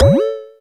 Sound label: talk start; smsunshine